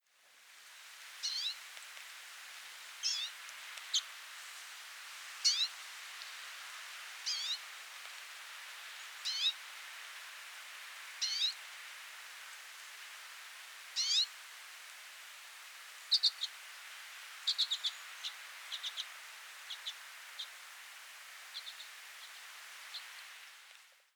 Lesser Redpoll Acanthis cabaret

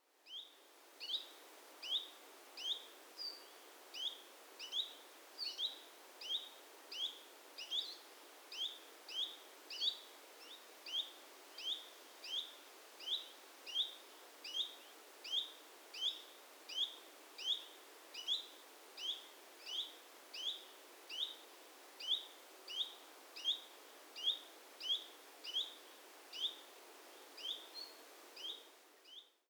Common Chiffchaff Phylloscopus collybita

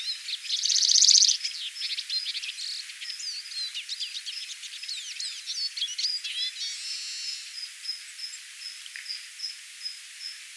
Lesser Redpoll Acanthis cabaret

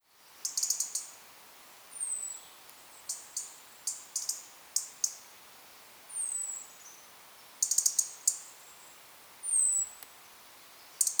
European Robin Erithacus rubecula

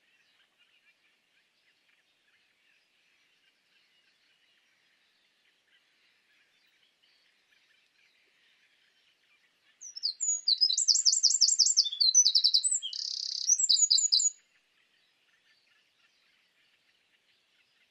Eurasian Wren Troglodytes troglodytes indigenus

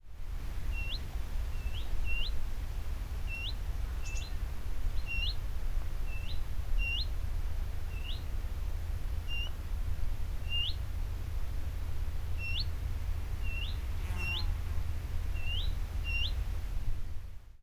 Willow Warbler Phylloscopus trochilus

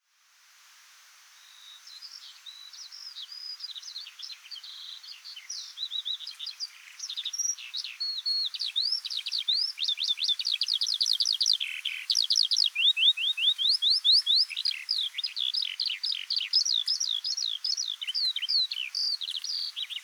Eurasian Skylark Alauda arvensis

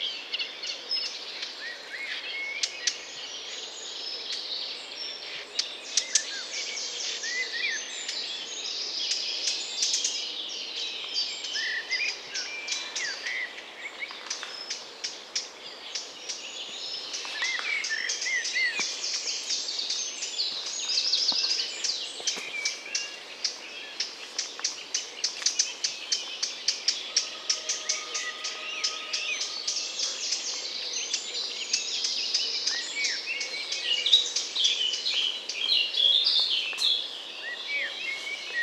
Eurasian Blackcap Sylvia atricapilla

